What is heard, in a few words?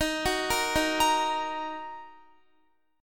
Eb Minor, Guitar; arpeggiated; x,18,16,15,15,17; 4E,4A,5D,5G,5C,6F